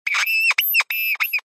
science fiction small creature tiny insect scream screech pain